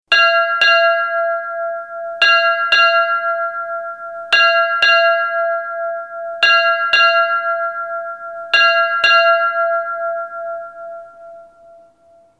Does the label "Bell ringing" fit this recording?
Yes